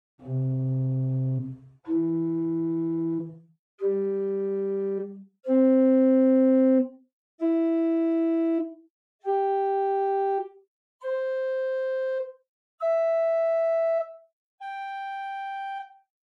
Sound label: Choralbass 4, organ; Buzard Opus 3